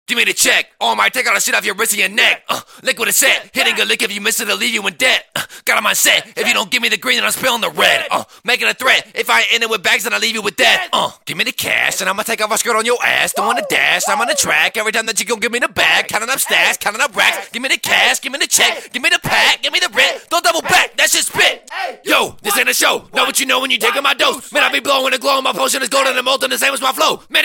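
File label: hip hop 172 Bbm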